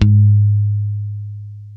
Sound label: G#3, ultra pick, bass; Spectrasonics; Bass Legends CD 1